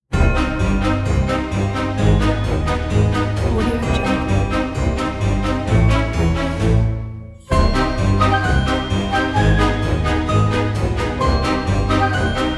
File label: halloween witches